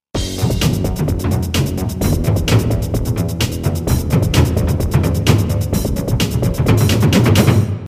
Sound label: taiko